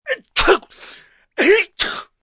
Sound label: Sneeze Two Short, Sneeze, male, two short sneezes, cold, sick, (human voice); Sneezing